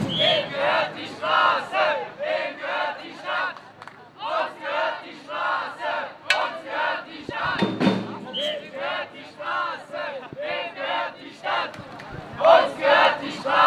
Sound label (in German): sprechchor; Freie Bürger im Dezember 2013